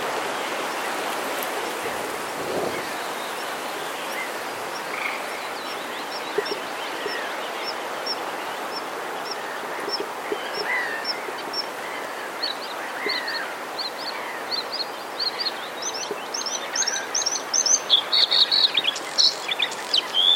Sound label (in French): gorgebleue; grand voyeux